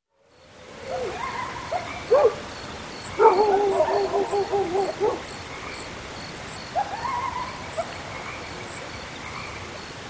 Mountain Ambience Insects in Close Perspective Coyote; Nature Ambiences